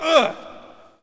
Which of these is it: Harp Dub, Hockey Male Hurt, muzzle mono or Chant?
Hockey Male Hurt